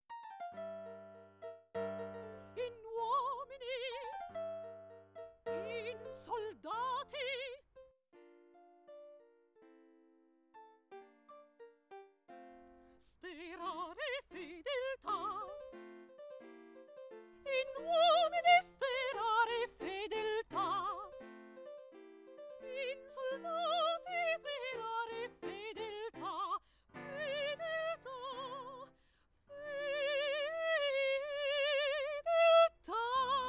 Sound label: Dry Music Panned; DTS